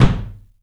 ROOM KICK; 3D Drums

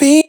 vocal shot d#; HIDEO

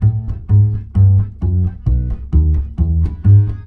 BASS, Balkanish Traditions; USB Soundscan vol.63; AKAI

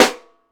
Caixa Open, Percussion; Roland BK-5

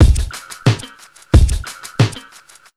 DRMS, Underground Hip Hop

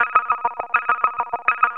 TRIMBLE, MISC VCS; Zero-G Ambient 1

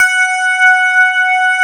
CLAV, FUNK FM CLAV

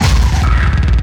Kaos FX, Ambient Grooves

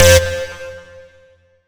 Synth One Shot C

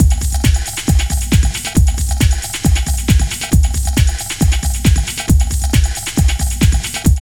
LOOP, Tekno Industrial; East West

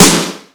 Electronic Snare; Yamaha PSR E305

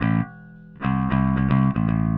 Bass, Indie Rock

